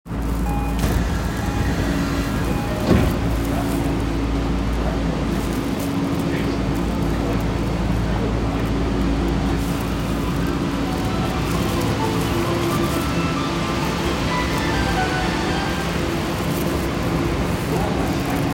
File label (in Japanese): 銀座線虎ノ門東, train sounds